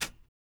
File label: TAMB, METALS; Spectrasonics; Liquid Grooves 1 & 2